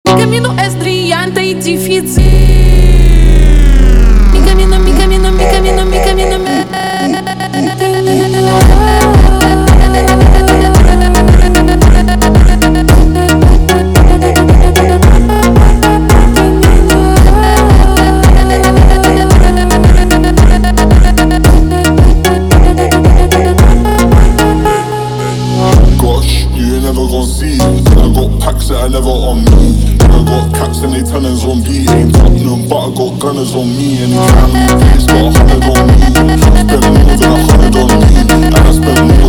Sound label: Funk slowed